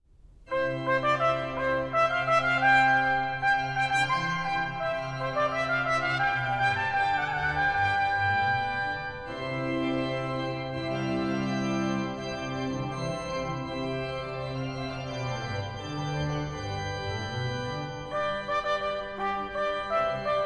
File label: Trumpet Tune, Trumpet and Organ; Musike from King Arthur; Warner